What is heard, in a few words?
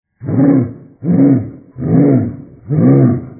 bark bark bark; vapesquared